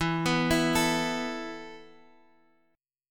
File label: E Suspended 4th E, down, Guitar, Half Step Down